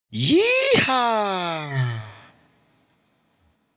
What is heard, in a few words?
yihaaaa